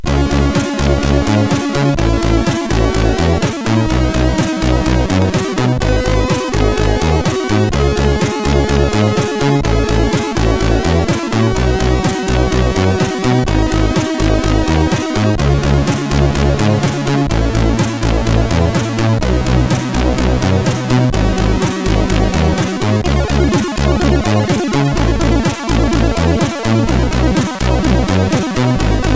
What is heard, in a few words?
ingame sid CSG8580R5; Flummis World